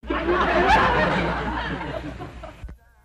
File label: 80s laughter